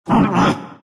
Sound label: broo pain; broodmother